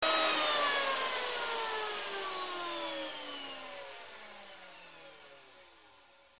tools electric plane stop

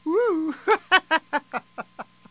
people

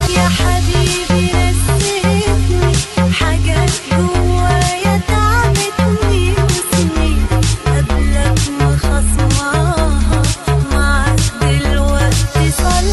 ringtones arabic

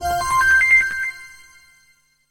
ALERT

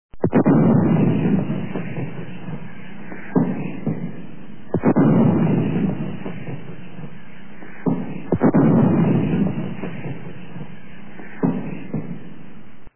155mm gun

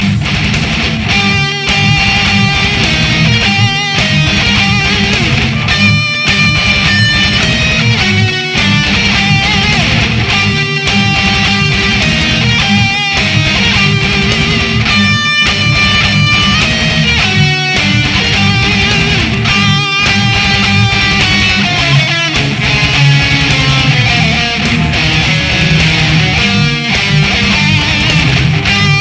rock heavy

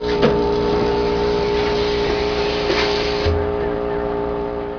vehicle

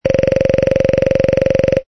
BIRD WOODPECKER

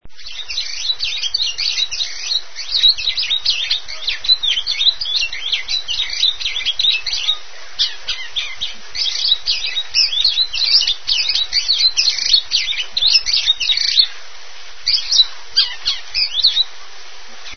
Pajaros BIRD CHRP

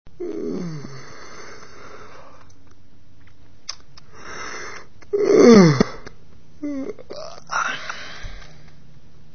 yawning

